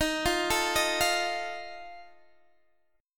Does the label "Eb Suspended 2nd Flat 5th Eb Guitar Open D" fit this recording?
Yes